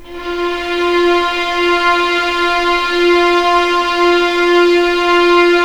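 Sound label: F4 legato mf violins, left; East West; The Ultimate String Collection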